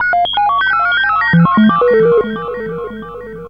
FX; AMG Megabass REMIX! VOL-2

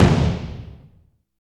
KICK, Ambient Kick; Roland L-CD701; Ambient K1

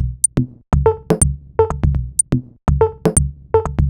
Sparse Top D 123bpm, House Drumloops; Zero-G House Fabrik Drumloops

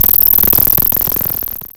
Hamster Wheel; Cwejman S1; DevSnd Cwejman S1 - JK

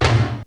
BREAK TAIKO; Crypton - DrumBase5000; GATE KITS

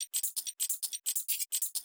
kitchen shaker; M8; percussion and foley

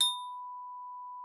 TRIS H5, VIBES; Xsample VOL-4

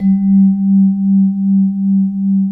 VIBRAPHONE A; ILIO

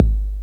BD, Dance Mega Drums, BD HOU; Best Service

